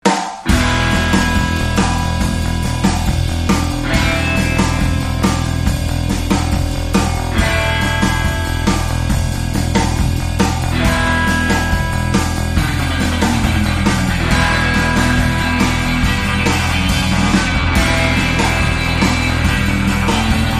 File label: Shark Bite